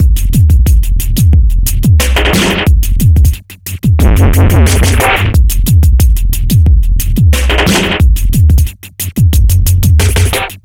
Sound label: dubbydrum distort 090bpm; Zero-G; Downbeat Dance Grooves